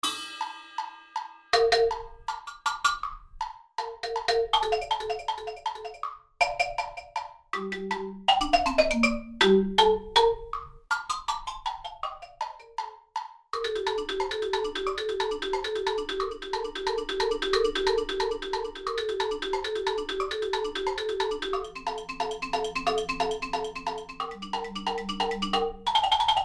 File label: Mallets Etude